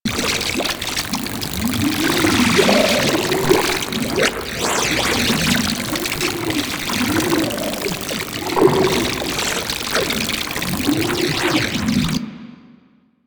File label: Triangle Shield Beam Loop; Warframe; Man In The Wall